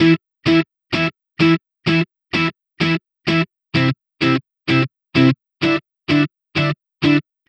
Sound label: Electric Pop Guitar 128BPM Emin; Electric Pop Guitars; Vengeance - Essential Clubsounds Vol.4; Guitarloops